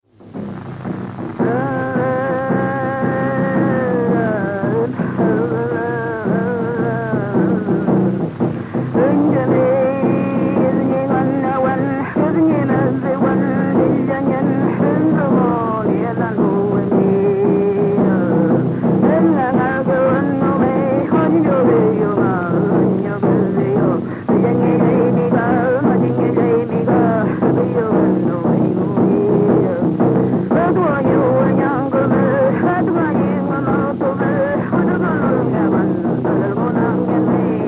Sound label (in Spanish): Canto de machi con kultrún; Collico, Puerto Saavedra, Chile; Música Mapuche